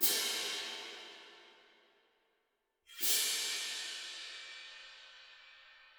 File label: Cymbals; Сэмплы ударных для фильмов: Cymbals E; Movie Score; 80BPM; Drums; film music